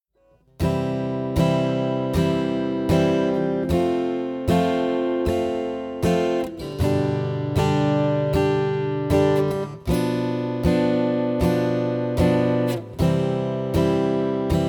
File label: Chord Progression Example, guitar